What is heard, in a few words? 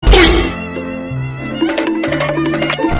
goofy ahh sound message tone